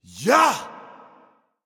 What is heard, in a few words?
grunt; smite; he bo